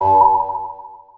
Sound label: Alien Reverb